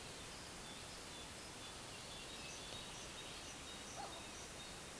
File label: Parus major